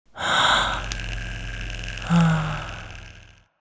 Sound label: Sound Logo Outro; sonic branding; girlonthenet